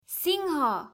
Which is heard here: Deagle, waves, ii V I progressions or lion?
lion